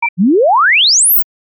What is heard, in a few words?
Glide Sweep 200 20k with Trigger